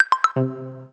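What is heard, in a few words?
Active Sync End, system sounds; Samsung SGH-i310; Active Sinc End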